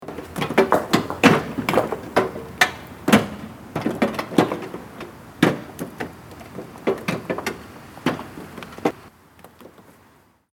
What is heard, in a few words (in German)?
Steine aufladen langsam